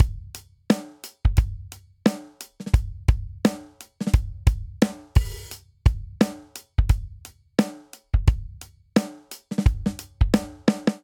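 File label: Rolling Hip Hop Verse Variant 87 bpm, drums; 4 4; MIDI Clips; Ableton; Factory Packs; Drum Booth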